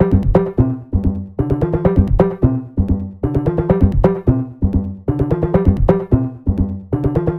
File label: 130 synth drum loop steel verb G